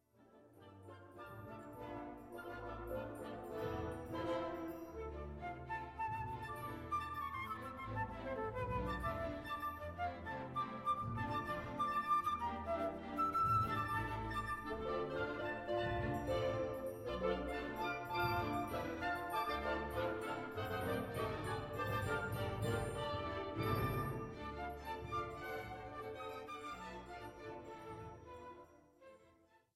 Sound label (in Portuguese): flauta